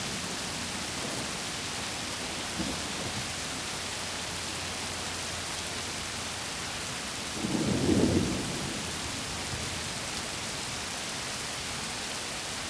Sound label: Rain Loop; Virtual City